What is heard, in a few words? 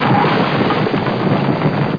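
plane crash; FLY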